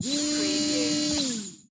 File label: Servo Motor; sound effects bible